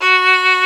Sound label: STR VLN, Str Quartet, Strings